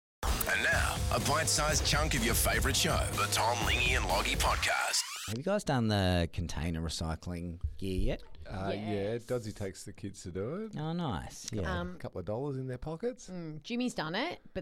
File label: crowd noise